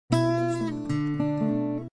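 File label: acoustic guitar, guitar; acgtr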